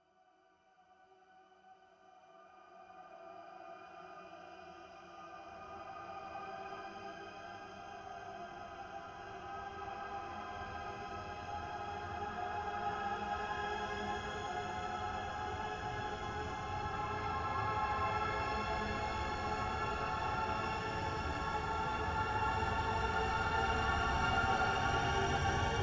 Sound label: Horror Build Up